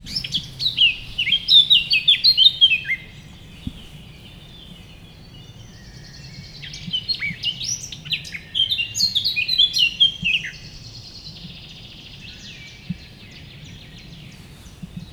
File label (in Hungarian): néhány madár, erdő; Visegrád